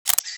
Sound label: Shutter